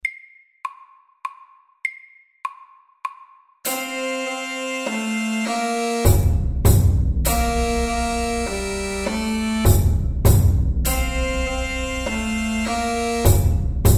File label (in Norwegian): Stomp FORT